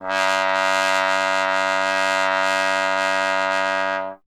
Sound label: Trombone F#0; Miroslav Vitous - Solo Instruments VOL-1